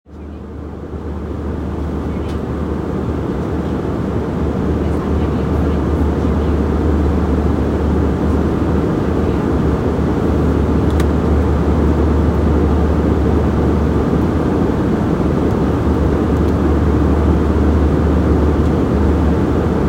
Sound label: Airplane